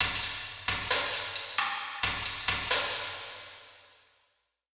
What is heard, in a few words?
drum kit; Diffusion; Processed